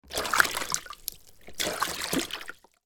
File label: water movement in plastic baby bath, household